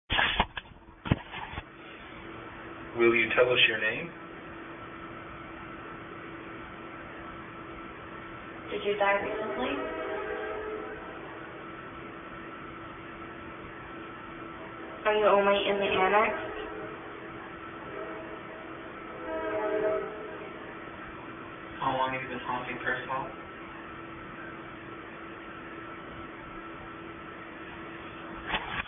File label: whistle scream